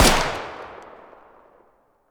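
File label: fire distant 300blk rifle exterior; gmod; arccw uc commons attachments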